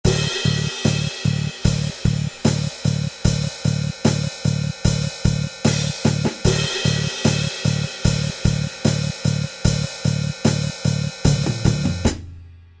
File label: drum